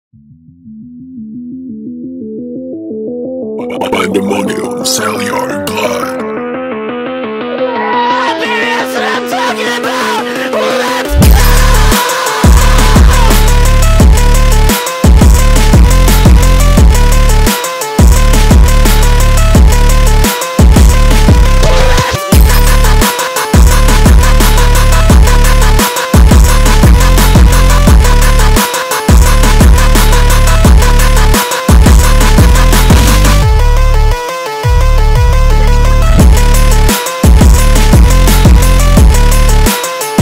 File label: Phonk Drift